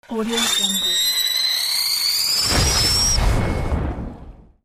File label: experimental whoosh